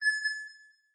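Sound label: smart notification; Sonniss GDC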